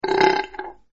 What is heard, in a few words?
belch; spongebob; super-easy-fun-adventure